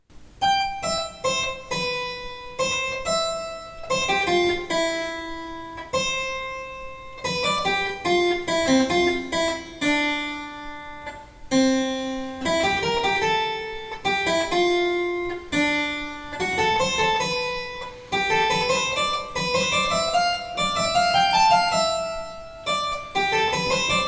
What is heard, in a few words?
bad minuet; Riepel